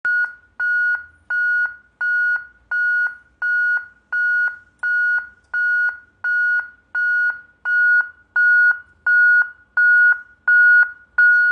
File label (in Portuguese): sirene alta potencia